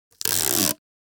Scotch tape pulling a piece of strip

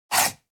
Piece sliding on wooden board game

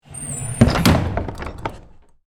Wooden chest box treasure closing

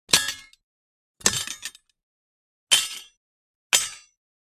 Katana dropping onto a hard surface

